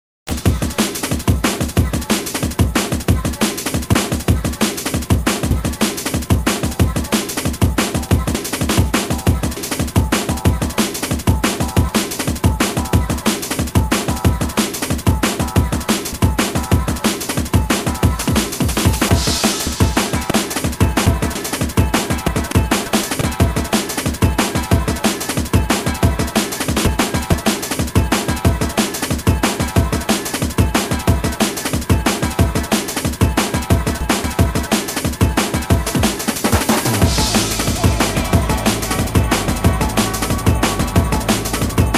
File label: Drum and Bass Jungle, UK Bass Radio